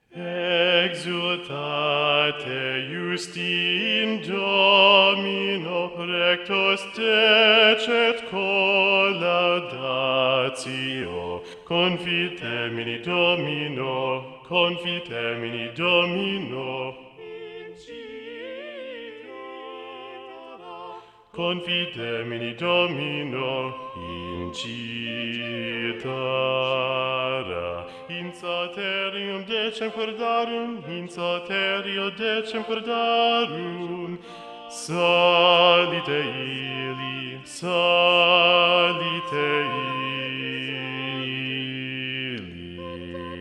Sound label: exultate justi satb bass predominant; viadana